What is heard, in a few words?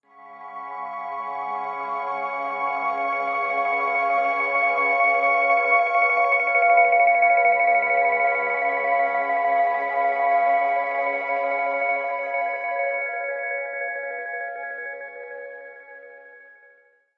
Premium clock alert; Nokia; 8600 Luna